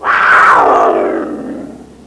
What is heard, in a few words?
cougar sounds